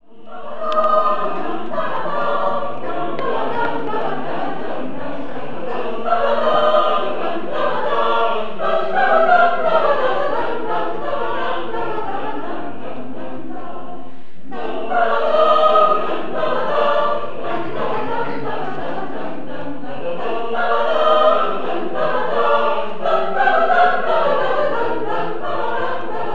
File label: gavotte; bach